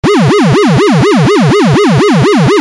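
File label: Siren Tone YELP (RUMBLER); UNITROL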